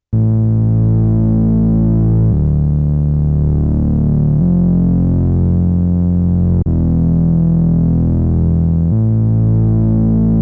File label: MS-20 Hovering Bass